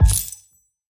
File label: lose sound